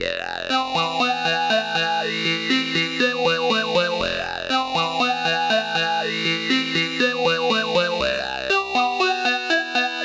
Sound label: Formant Synth